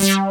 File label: DRIVER BASS; Pro-Rec - Synthsonic; BASSES